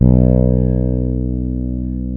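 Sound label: fretless B1, bass; InVision Interactive; Lightware VOL-1; Instruments & Percussions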